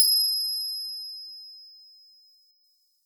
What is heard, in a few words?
Animation Fly To Downloads